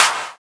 Claps; Vengeance - Essential Clubsounds Vol.5

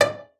FLAG D5; Xsample VOL-8; LAUTE+FLG

